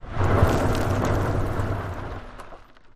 Car Tires Dirt; Skid Stops, On Board Perspective 7x